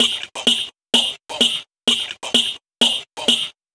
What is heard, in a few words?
Minimal Loops